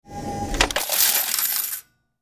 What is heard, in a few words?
Vending machine coins dropping into return; money